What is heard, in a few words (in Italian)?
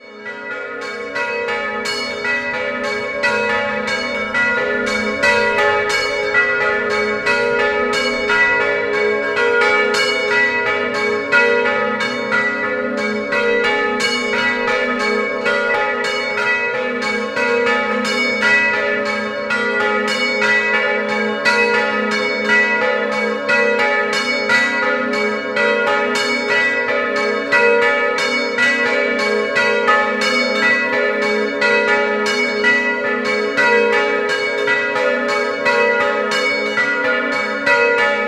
Collemonaco, campane